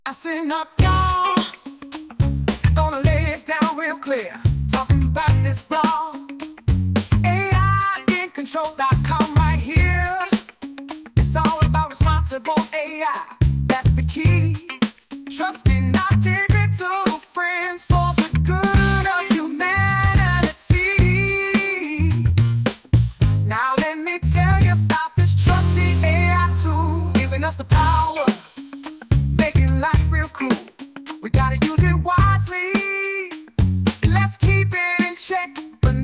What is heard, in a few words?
Groove Machine